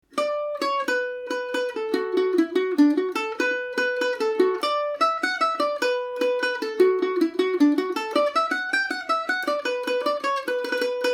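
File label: B minor, Irish mandolin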